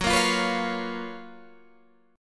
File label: F sharp Major 11th F sharp, Guitar Standard; strummed; JGuitar